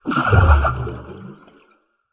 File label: air cannister spray release underwater short burst; industrial